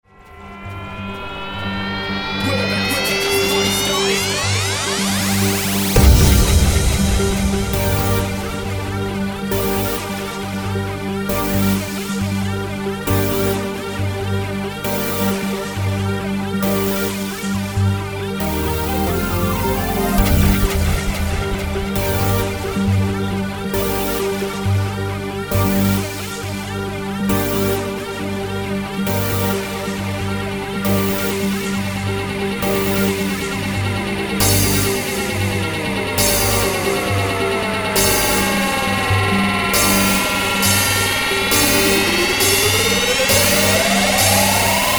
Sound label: voice of siren